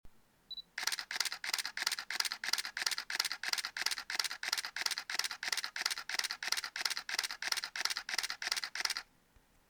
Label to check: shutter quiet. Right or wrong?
Right